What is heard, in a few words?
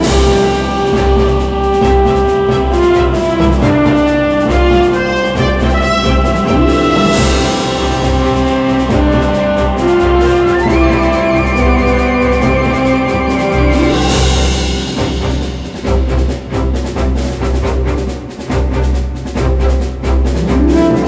Normal Dungeon Victory